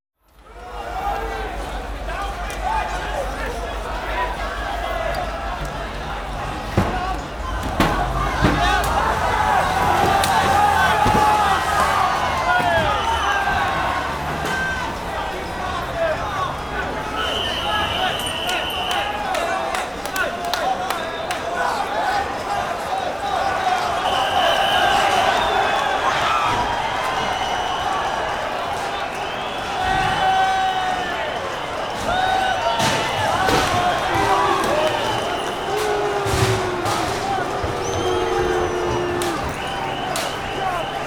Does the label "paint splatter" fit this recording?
No